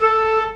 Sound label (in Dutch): viool